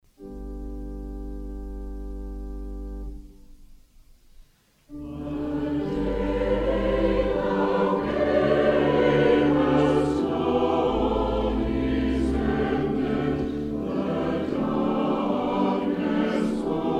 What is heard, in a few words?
Choir, hymns